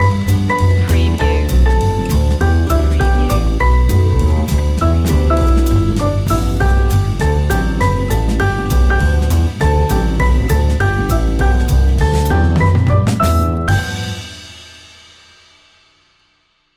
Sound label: jolly old st nicholas jazz